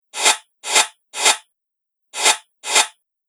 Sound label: Sharp Chopper Loop, machinery; Looping Sound Effect; Fantasy, Horror And Sci-Fi Games